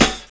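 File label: SD, snare drum; X-Static Goldmine 1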